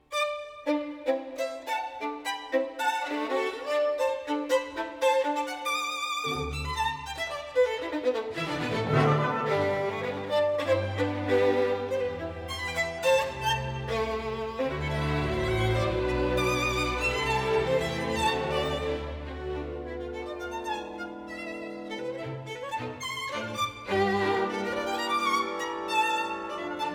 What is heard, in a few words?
Violin Concerto in G Major Allegro con spirito